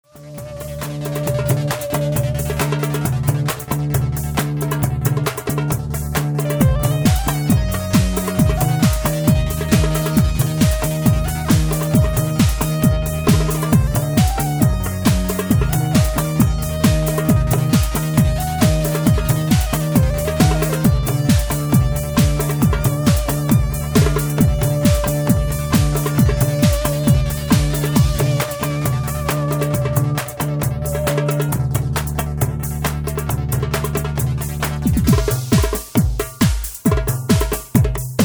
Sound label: dance and drum